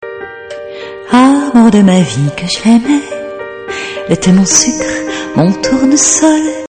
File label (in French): Ballade